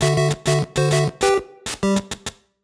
Scene Change BGM; classic music collection; arcade; family computer; gb; gba; nes; snes; GBC

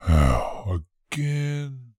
Fall

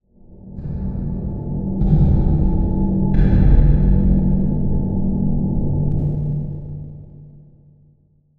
Ambiance

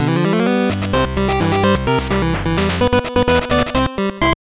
Jingle, soundtrack; Super Momotarou Dentetsu II; GB

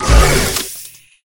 Pickups

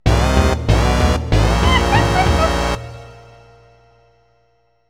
Failure; donkey kong 64

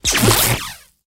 Pop Out

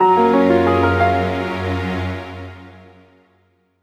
(Jingle) Fanfare

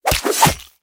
Fight Attack Lance; Mighty Party; Android

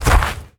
Fishmen Footsteps Dirt

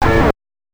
Guitar Riff; American Gladiators; NES

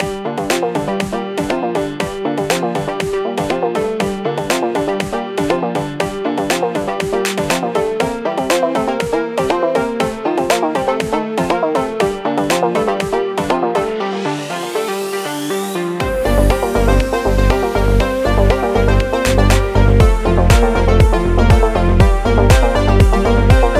music dark-comedy; Necrobarista Final Pour

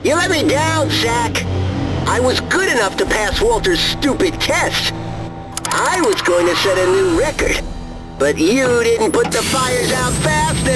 Flashback; Emergency Heroes; wii

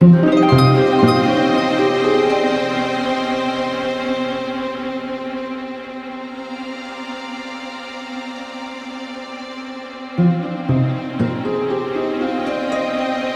Tension

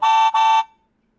Golf cart Horn

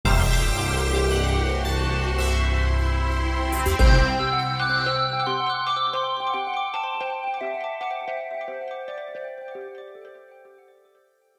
Classic (Lose); bejeweled classic

